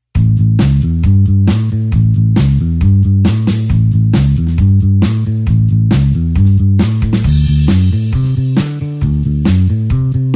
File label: blues bassline 8beat A